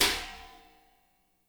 CHINA, HI-HATS; Spectrasonics; Burning Grooves

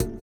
VIBRA; Spectrasonics; Metamorphosis

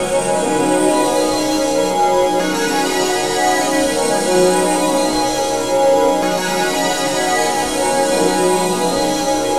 Aurora Melodic, Tonal Atmospheres; Sonic Foundry (Sony Creative Software); Myths of Technology

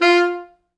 F3, Pop Brass; Best Service ProSamples vol.19; AKAI

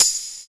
TAMB 2; East West - Phat & Phunky CD2; 90's Sample CDs

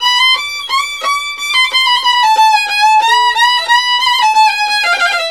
VIOLIN, Balkanish Traditions

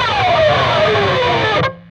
PRS SCRAP, Guitars; PRS SCRAPES

